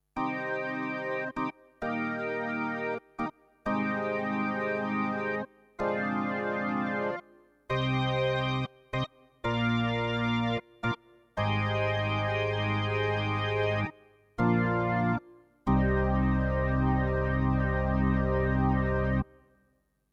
Yamaha P105 Rock Organ

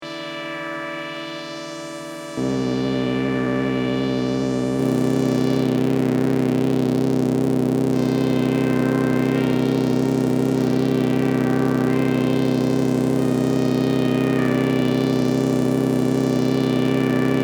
subbass via reso

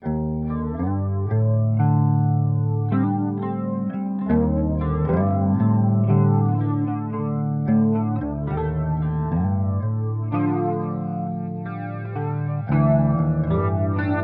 Walrus Audio Polychrome Flanger

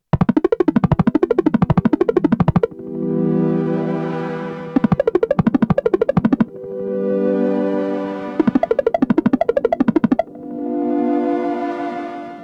Modal Argon8

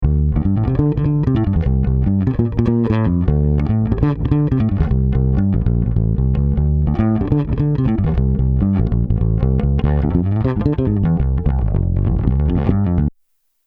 Chandler TG 1 Bass Lim 16db gr

